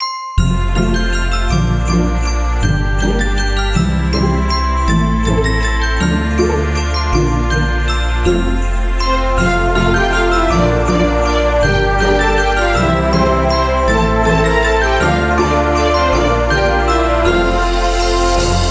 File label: we wish you merry christmas